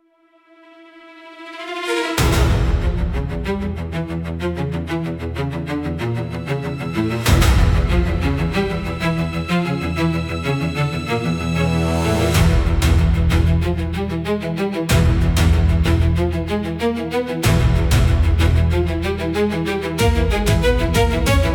Ticking Shadow Run, high tension suspense

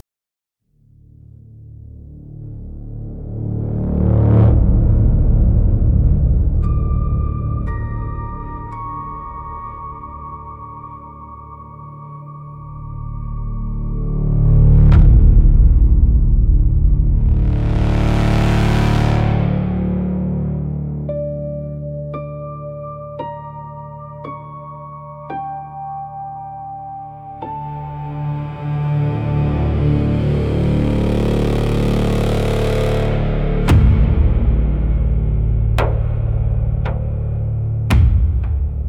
spiritual spooky soundscapes

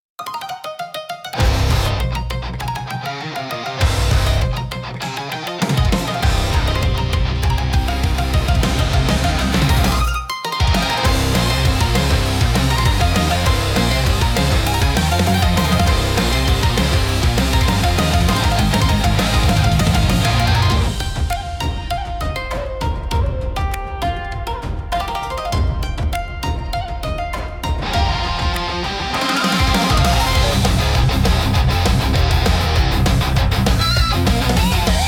intense japanese rock

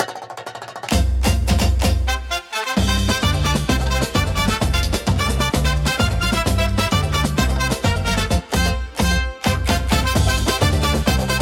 Carnaval Pulse, upbeat carnival; for festivals and parades

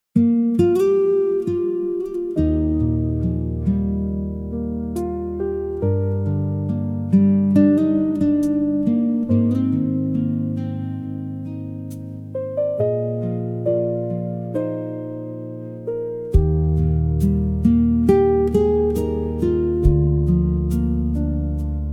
fantasy music nature forest

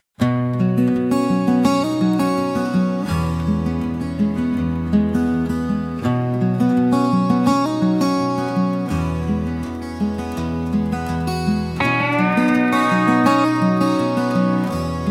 Rain on the Porch, country acoustic

